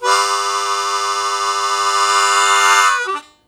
Blues Chord Attack G1, Harmonica; Zero G - Harmonica

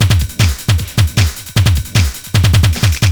LOOP, Eurotech; Sounds Good

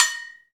Fijidera; Club-50 - Foundations Roland; Agogos

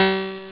bell; Amiga Soundtracker sample

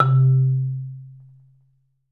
MSOFT C2, Marimba

